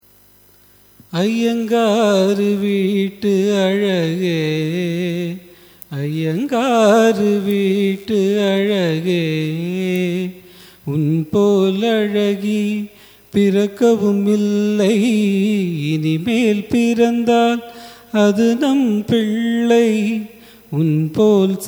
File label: raga nattai cine